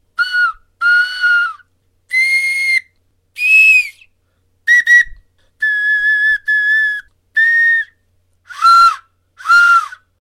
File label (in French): Sifflet globulaire